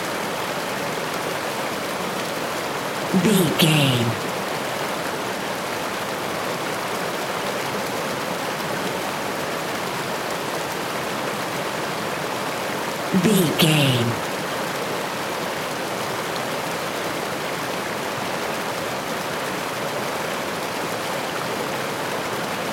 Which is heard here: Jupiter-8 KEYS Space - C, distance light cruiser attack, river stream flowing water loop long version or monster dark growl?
river stream flowing water loop long version